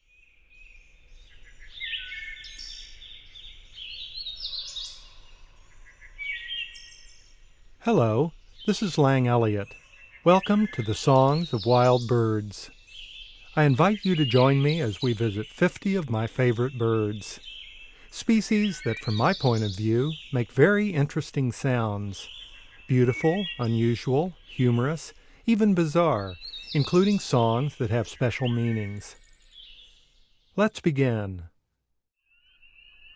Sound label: Common Loon, Bird Sounds; North America; Common Western Species; Know Your Bird Sounds